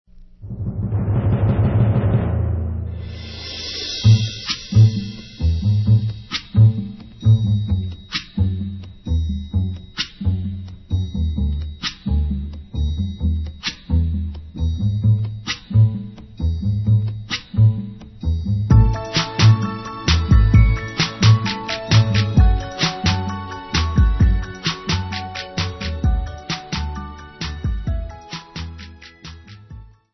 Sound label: instrumental